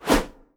blade 1 hand swing

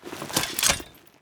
blunt 1 hand draw; equip

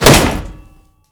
shield heavy bash

